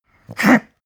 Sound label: pug gag breathe short; natur-tiere; hunde